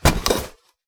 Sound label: board holster; hammer; melee; tfa starwars melee weapons pack